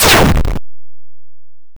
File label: Shell Explosion; Gloom